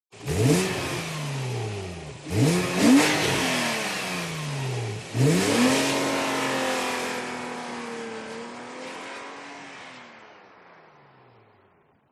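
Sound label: SPORTS CAR Exterior Drive Away Fast; TRANSPORTATION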